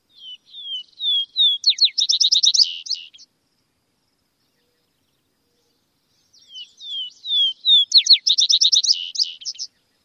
songs

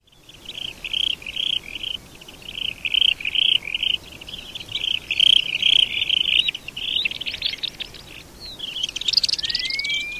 various calls

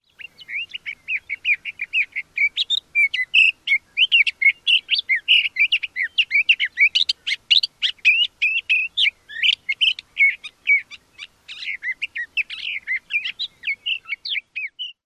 song

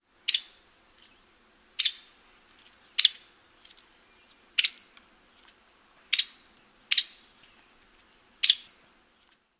calls

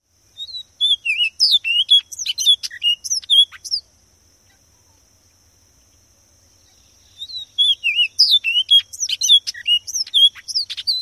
song

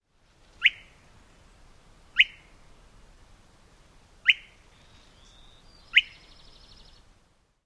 whip calls; AZ

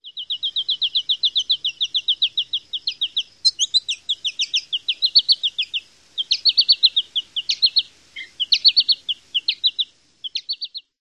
rapid calling

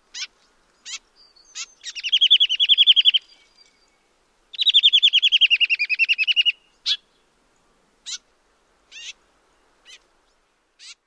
calls

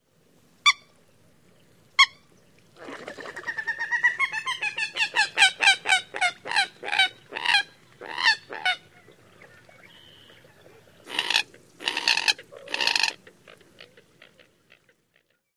keek and other calls

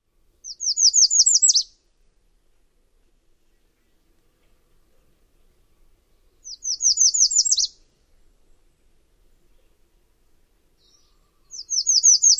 songs

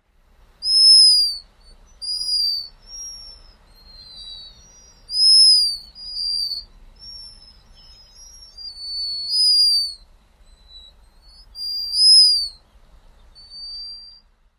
shrill whistles

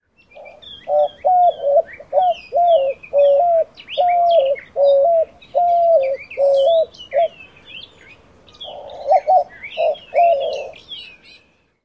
songs

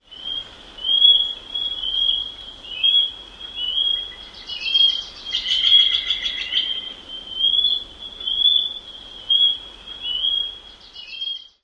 gull-like squeals

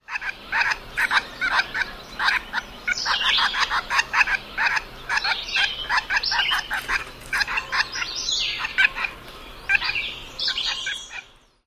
interaction calls

